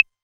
808 Clave, Novation Drumstation; Novation DrumstationV1

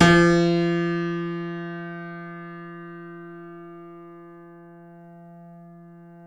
C7 FF, The Ultimate Piano Collection; East West

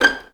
VI PIZZ G5, Advanced Orchestra; Best Service; Peter Siedlaczek's Advanced Orchestra

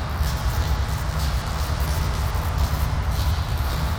sparse soundscape samples; ReLooped

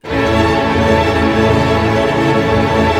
ARP A, STR ARP MAJ; Sound On Sound - Peter Siedleczek's Orchestra

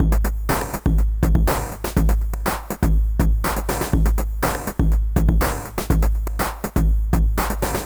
Drums Strange

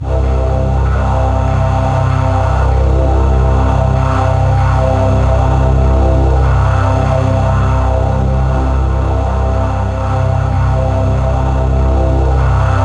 C2, Synth Leads

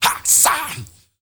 MALE RAVE, MALE NOISE, Heart of Africa; Spectrasonics; Heart of Africa 1

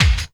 bass drum, Drum & Bass; Ueberschall; Drum & Bass Flash Trackz

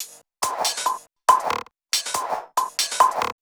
Loops 140 BPM Hihat Percussion Loops

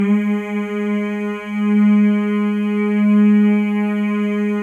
MALE MMS, Symphony of Voices; Spectrasonics

